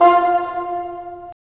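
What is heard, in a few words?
wind bassoon; LA Composer vol. 3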